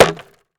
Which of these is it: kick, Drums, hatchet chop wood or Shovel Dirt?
hatchet chop wood